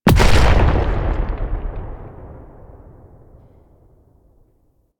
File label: Asteroid Explosion; Hazards; Warframe; Railjack